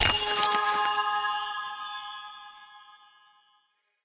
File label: bonus Pick Wild; secrets of christmas